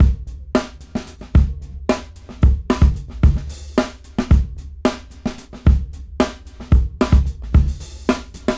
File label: Brown Dry 111 BPM; AMG - Keith LeBlanc Phat Butt Jams